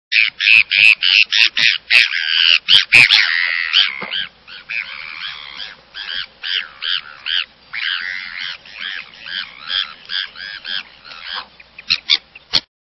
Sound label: Bonapartes Gull